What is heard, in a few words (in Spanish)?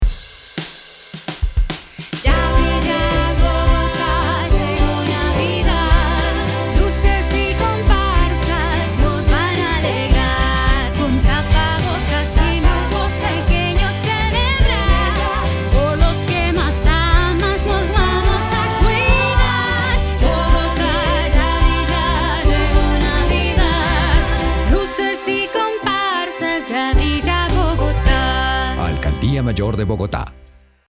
Jingle Navidad; ALCALDIA MAYOR DE BOGOTA